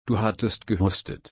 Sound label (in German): husten